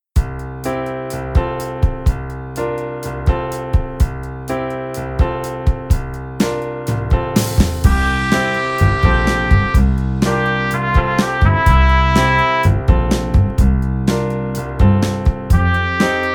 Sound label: Trumpet; easy beat vol 1 trompete